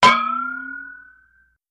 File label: Ding Metallic Hit, chimes & bells